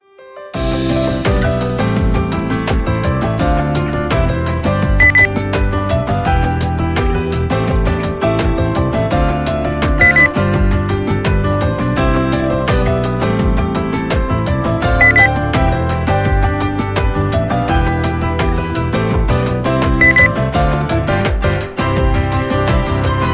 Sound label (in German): gute laune